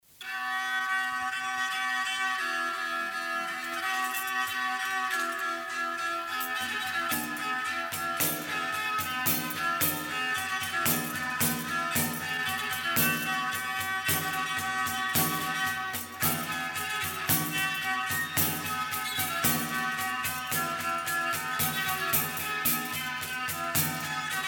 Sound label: POSKOČICA, dalmatia traditional pop; multimedia corner